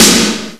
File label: snare